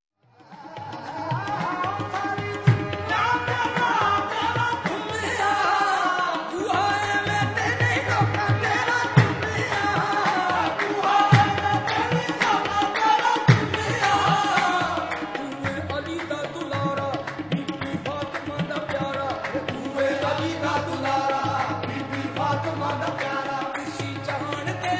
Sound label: Vocal Sufis; World Sounds